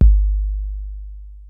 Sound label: PHAT TRAX KIT, DRUMS; Kid Nepro; Elite